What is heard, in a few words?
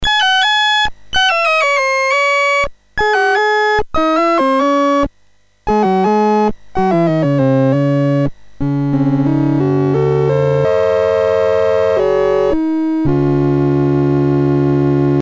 sid MOS6581R3, GAMES; Apartment of Mystery BASIC